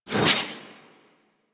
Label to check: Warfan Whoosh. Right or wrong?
Right